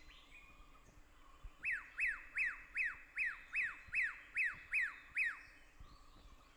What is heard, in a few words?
racket-tailed drongo call